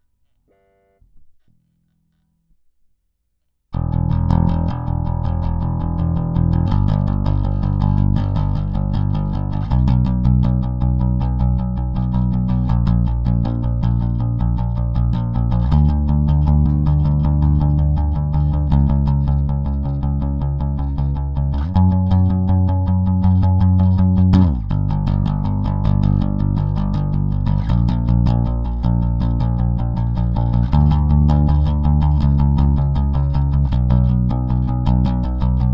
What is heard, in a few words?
Bass Pre Fuzz Comp